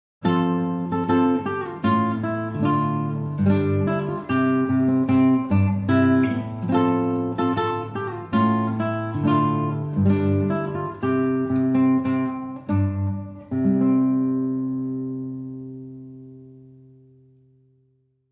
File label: Solo Guitar